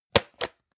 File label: plastic can small lid close hard